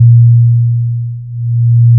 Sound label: additive cello single; manhattan; FFTimbre